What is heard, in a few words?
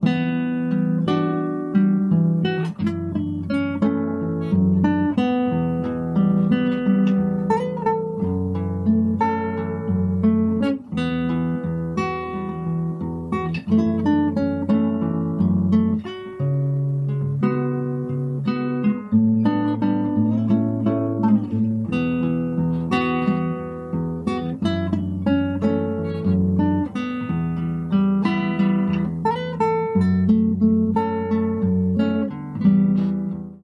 guitar; STALKER